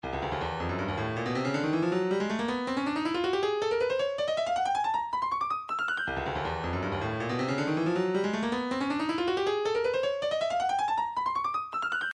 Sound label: room, midi